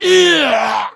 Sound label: troll crit; Halazzi